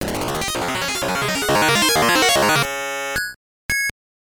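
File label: Jingle, video game; game boy wars turbo 1997 sgb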